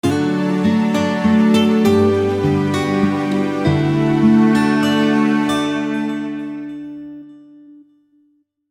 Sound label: lovely acoustic guitar